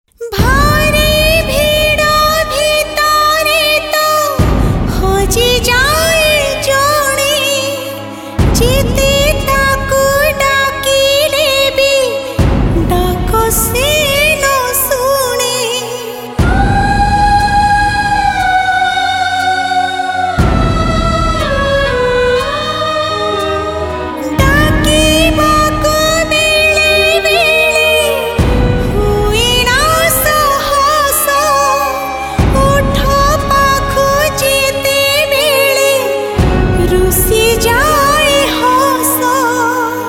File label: sad, female